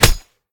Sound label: hit; Smite; Tiamat